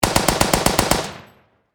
Warfare Machine Gun .303 Caliber Vickers Long Burst Distant Perspective; SFX Bible 6